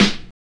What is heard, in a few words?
Snare, Drum and Bass